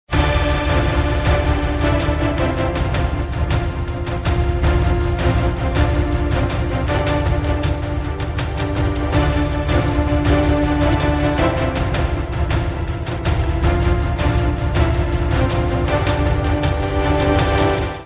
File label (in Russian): logotip studiia novostei